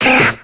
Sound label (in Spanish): Grito de Rattata